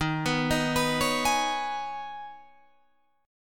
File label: D sharp 7th Suspended 4th Sharp 5th, Guitar, Open F; D#7sus4#5; arpeggiated